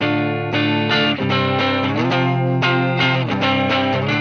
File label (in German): fabfilter pro-q 2 hoehen natural phase